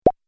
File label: Idea Single Pop, cartoon